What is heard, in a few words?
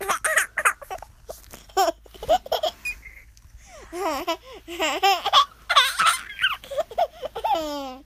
Baby Laugh